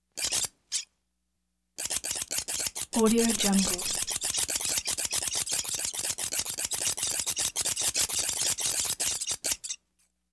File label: scissors single snips fast constant cutting